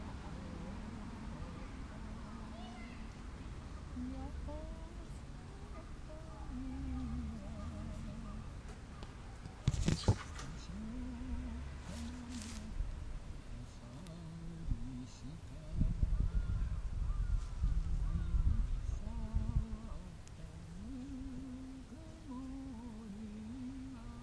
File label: old japanese man singing